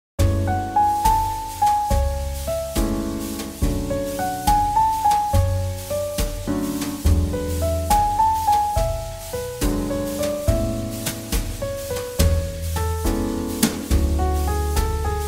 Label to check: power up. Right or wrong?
Wrong